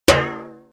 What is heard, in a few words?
cartoon drum; TECKNAT